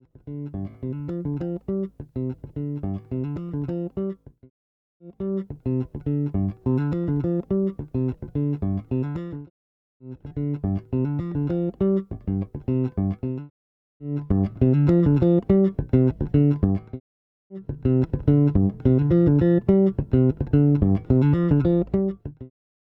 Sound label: Bass Preamp Compressor; SkarBassOne